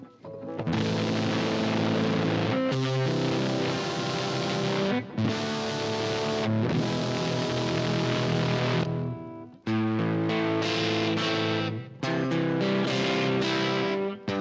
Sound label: fuzz